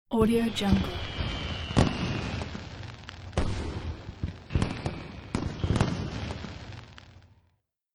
new year fireworks

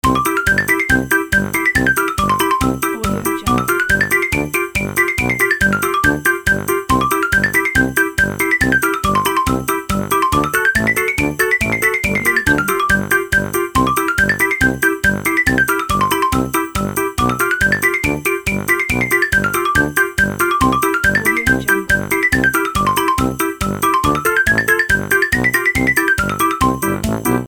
cartoon loop

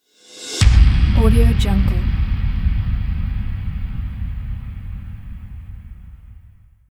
epic transition

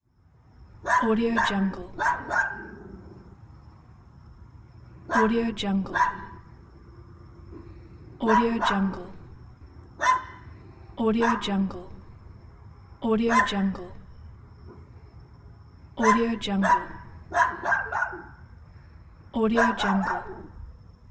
small dog barking at night

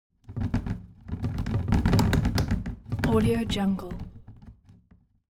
object plastic trash can rattle; smartsoundfx

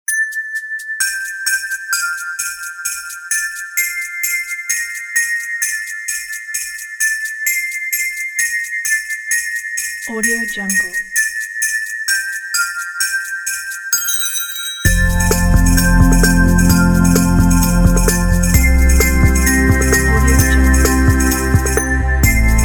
christmas carol

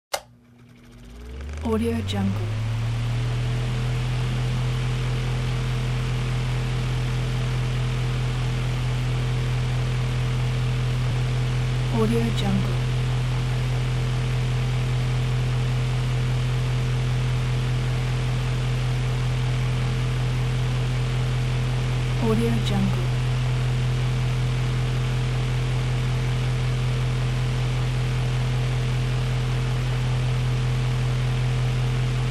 portable fan

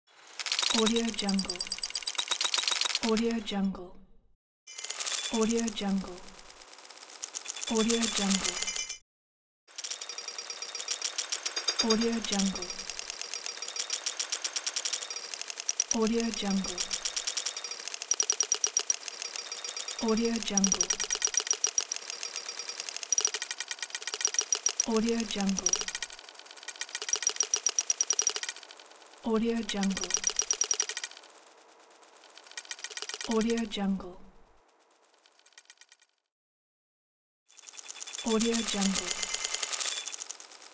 futuristic rattling elements; kaelv

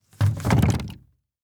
orange juice set down; blast drive 30; prosoundfx

